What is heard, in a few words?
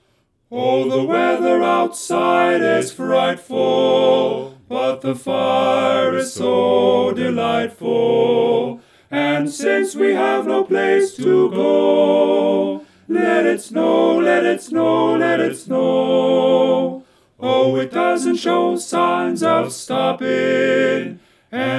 tenor right